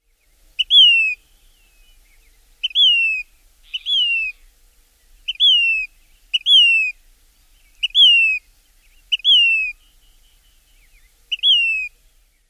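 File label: SAYPHO songs num 1, Say's phoebe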